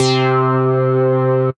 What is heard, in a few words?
Retro Synthicon; Synth Keys